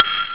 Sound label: Claves